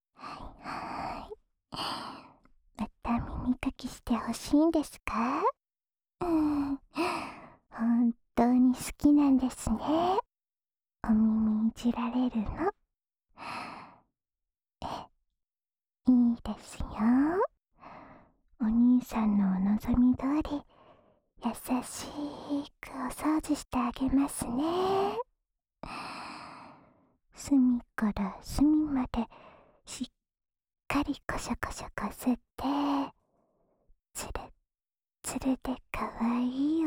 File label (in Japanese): 安眠用耳かき（右耳）; 耳かきリフレ『春乃撫子』